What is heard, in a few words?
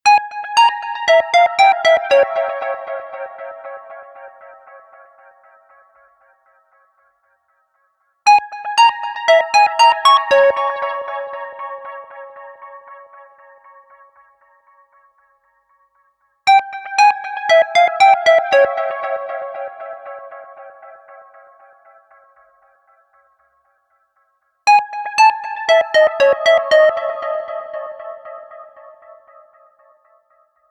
ring; Punkt